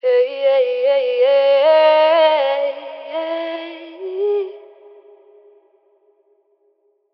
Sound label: Ad Lib Wet C# Min, Vocals; Cymatics - Eternity Sample Pack; Ad Libs